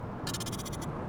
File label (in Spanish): Balido